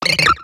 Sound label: scraggy; cries